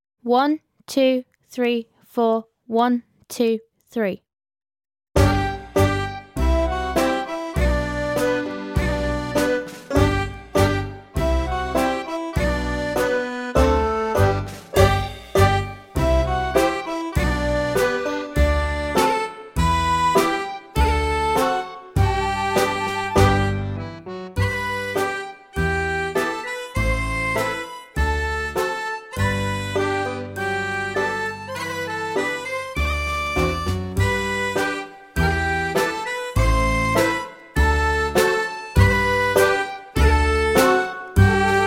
polka backing track

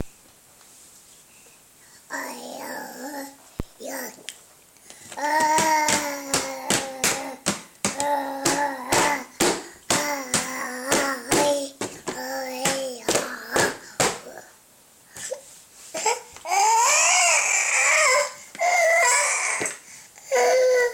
random squawking

